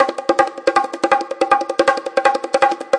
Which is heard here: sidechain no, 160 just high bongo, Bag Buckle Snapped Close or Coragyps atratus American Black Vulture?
160 just high bongo